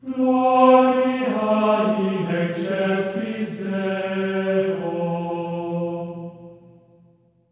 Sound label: GLORIA F4, Symphony of Voices; Spectrasonics; GR GLORIA